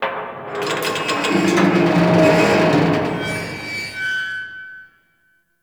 Metal Subway Car Heavy Creak; Warframe; Ambience; NineteenNinetyNine

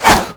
Whoosh, Weapons; Infested; Coda Hirudo

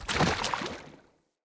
Wolf Steps Land Water, Jump; Animals

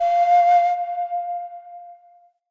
Flute Alt F; Player Instrument

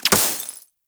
Laser Impact Glass Large

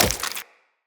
Wall Jump Flesh; Footsteps; Wall Run; Characters; Tenno

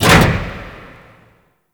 Pod Lift Stop; Grineer; Canno Pod; Elevators

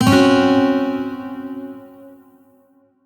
Shawzin Hirajoshi Chord L; Music; Warframe; Lotus Shawzin

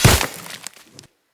Great Sword Melee Impact Vegetation; Weapon Impacts; Player; Swords; Environment; Founders Great Sword

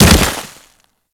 Kubrow Land Snow, Footsteps; Enemies; Warframe

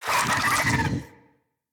Infested Big Ashen Vocal Attack Death; Enemies

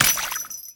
Jester Steps Water, Footsteps; Foley; Warframe; Grineer Royal Jester

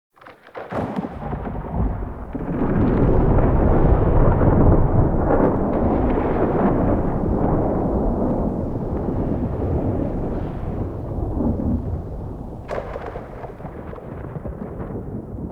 Exterior Rolling Thunder; Ambience; Warframe; Duviri; Murmur

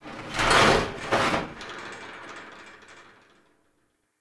Exterior Tractor Metal Groan; Ambience; Props

